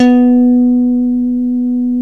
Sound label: ALEMBIC BASS; OMI - Universe of Sounds